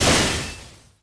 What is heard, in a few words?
small explode